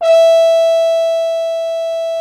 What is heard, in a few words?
FR HORN E4, FRENCH HORNS; Greytsounds VOL-1